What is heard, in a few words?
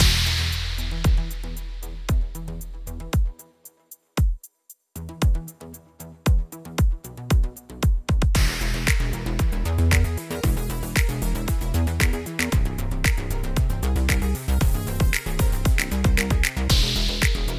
Mix Stem Layer; quantum recharged gamerip 2023